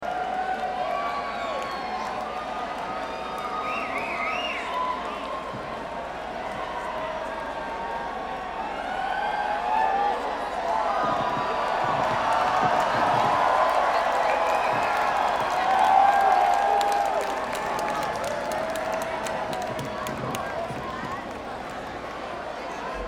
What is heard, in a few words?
Crowd